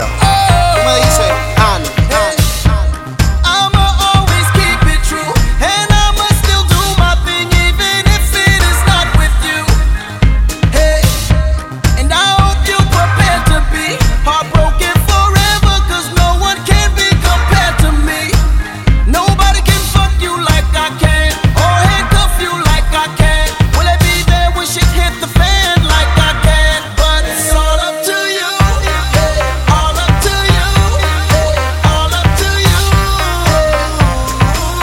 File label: Hollywood Ringtone